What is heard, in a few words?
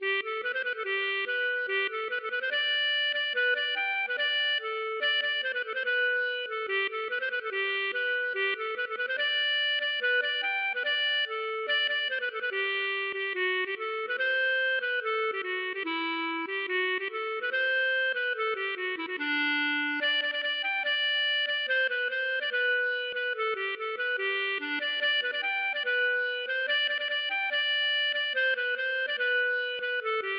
gavotte; Aven Duo 2R